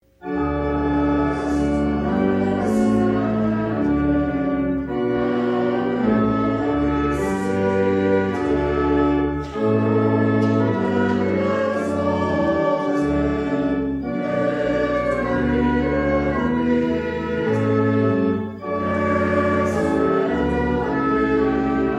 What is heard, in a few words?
trumpet